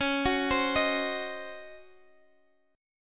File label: C sharp Major 7th Suspended 2nd Suspended 4th C sharp, Guitar; Half Step Down